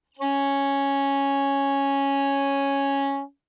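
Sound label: Soprano Saxophone non vibrato ff Db4; Woodwinds; Bb Soprano Saxophone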